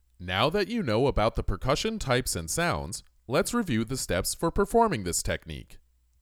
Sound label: Percussion